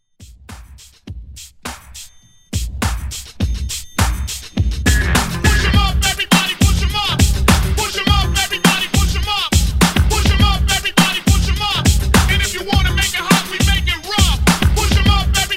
Party Break Rework

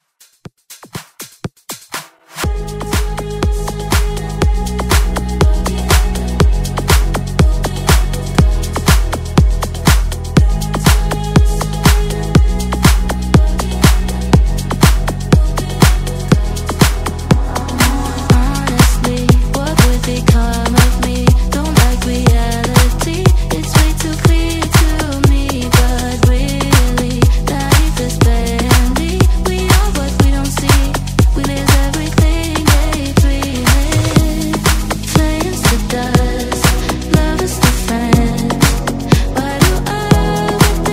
Dance Redrum

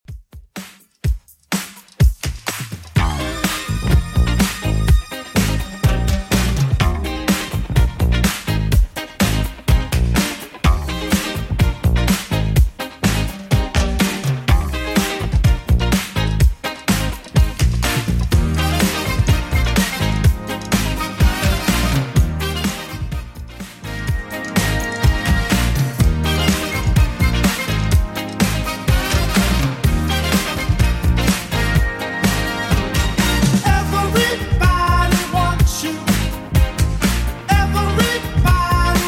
80s 125bpm; Redrum

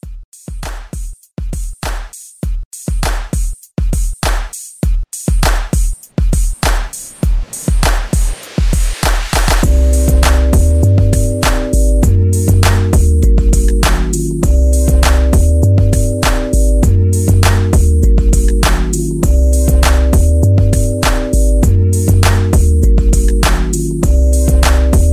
Re Drum

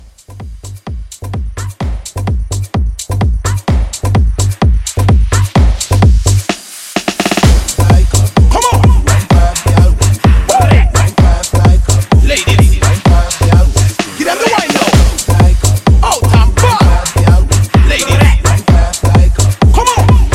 Mashup Edit 128

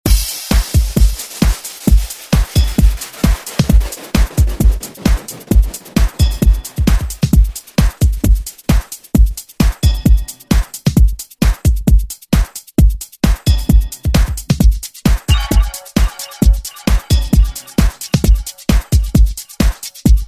90s ReDrum; remixplanet

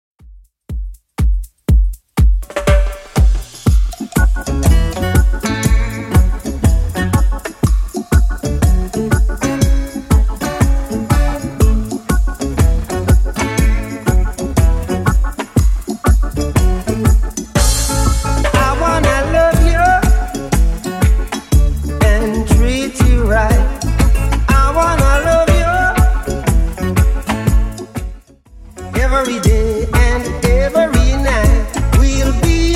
Reggae Simple Redrum 121bpm

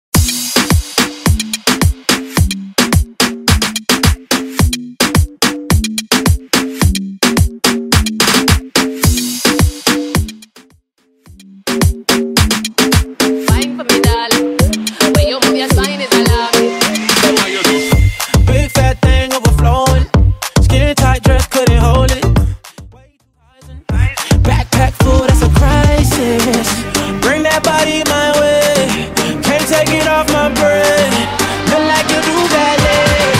Moombahton 108

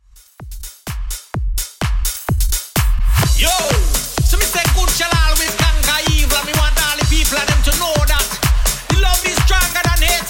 Dance Redrum 127Bpm

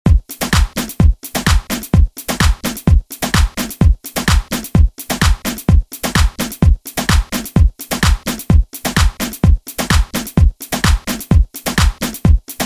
Latin Touch Re Drum

